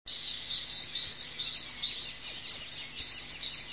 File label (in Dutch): pimpelmees